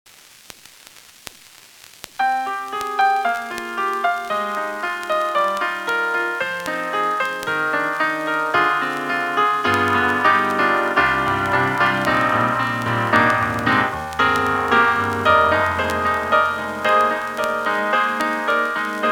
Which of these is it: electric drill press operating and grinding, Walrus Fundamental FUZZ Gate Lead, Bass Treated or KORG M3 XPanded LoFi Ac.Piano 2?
KORG M3 XPanded LoFi Ac.Piano 2